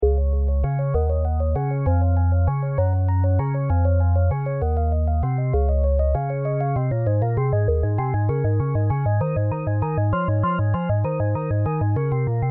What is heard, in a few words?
music